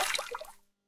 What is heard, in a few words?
crop rice